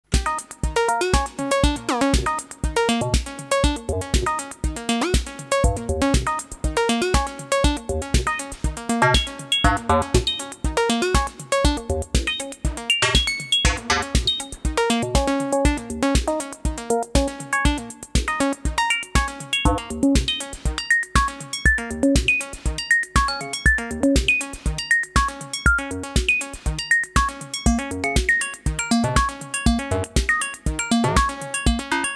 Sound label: Behringer Brains oscillator; Behringer Brains oscillator demo